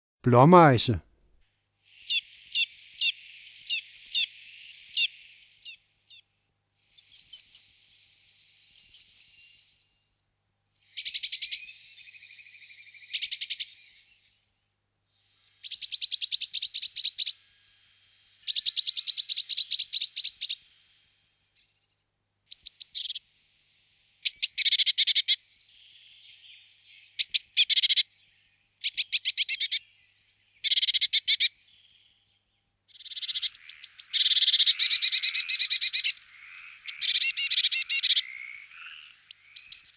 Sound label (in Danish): Blamejse